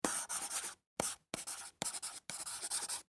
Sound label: Office Pencil Write On Paper; Writing; Human